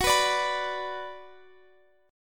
Gb Suspended 4th Sharp 5th Gb, down, Guitar, Open F; strummed